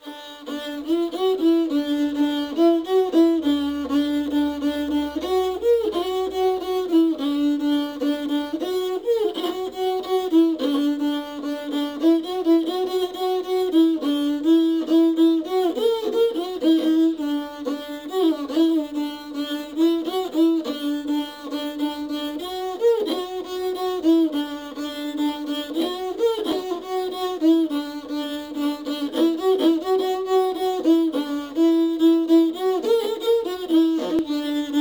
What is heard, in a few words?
Naaba komplett 105 bpm